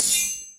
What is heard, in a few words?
gear